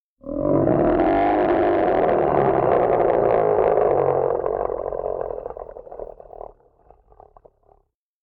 Bear Growl

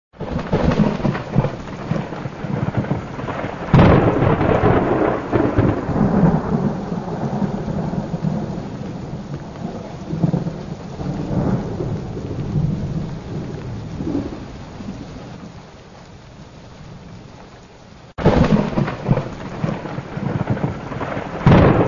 Thunder Storm

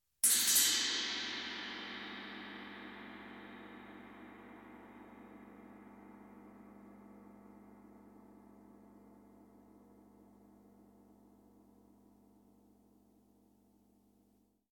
Cymbal Suspense

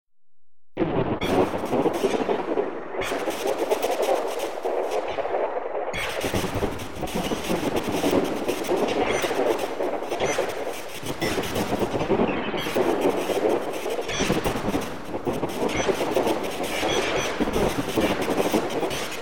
Thunder In Space

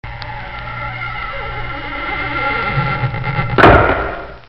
Creaking closing door

